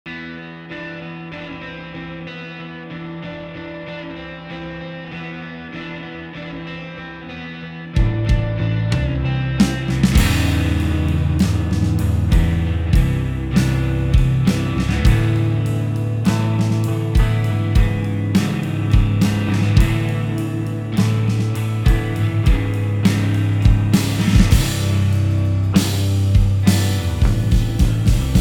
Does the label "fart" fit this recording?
No